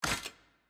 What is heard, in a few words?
Cable Put Down